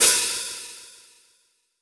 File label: Brush Open HiHat, Roland BK-5